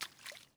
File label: Foot Wet Puddle Walk; We Happy Few